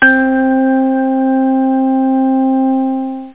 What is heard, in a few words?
organ 1; synths; Zoom - Release 2; Active Software